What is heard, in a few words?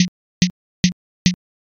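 footsteps leather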